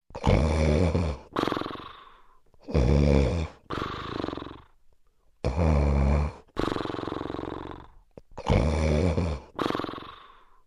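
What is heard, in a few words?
cartoon snoring; Звуки, где люди спят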